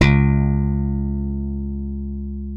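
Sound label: left thumb C#2, slap thumb; Xsample VOL-1; 90 s Sample CDs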